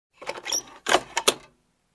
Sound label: Putting paper money in cash register